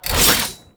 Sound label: Whoosh, weapons; Broken Frame Warfan; Quassus Prime